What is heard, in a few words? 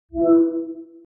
ios18 facetime accept; Системные звуки и уведомления iOS 18 (iPhone 16, 16pro, iPad)